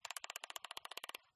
camera aperure ring click movement open close; fuji; mkh8040; PMSFX

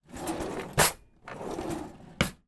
household drawer cutlery kitchen open then close

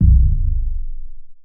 Impact Boom Rumble; bottlerocket

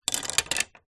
foley wood spoons x2 drop down on wood surface; zapsplat

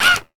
science fiction robot movement single; sound effects one

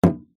instrument drum gamelan hit single

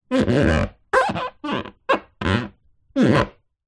sport surfboard body hand rub squeak

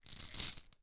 food popcord kernels pour into hand; zapsplat

